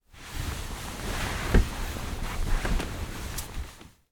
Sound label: person in bed movements under covers; foley